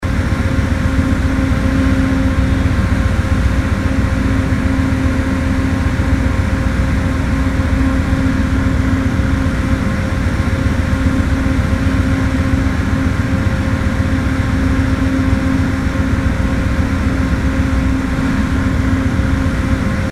Train Idle Looping

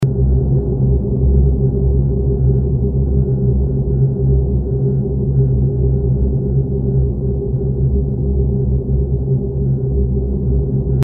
Mech Drone

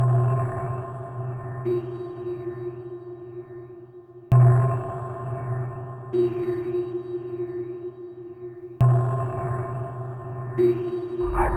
Closing In, horror surreal

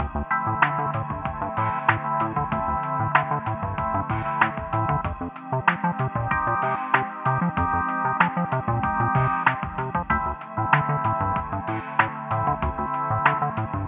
Pixel Balloons, LoFi, puzzle music

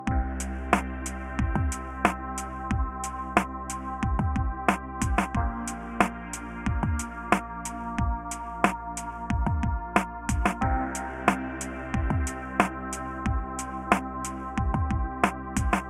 Game Menu LoFi, puzzle music

